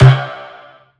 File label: Bass; DF KIT